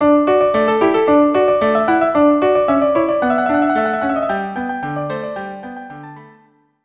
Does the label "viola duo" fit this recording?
Yes